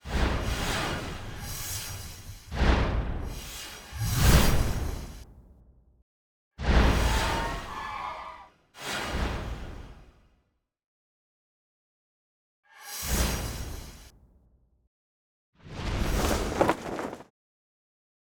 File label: SFX; NewsOneNow; Moving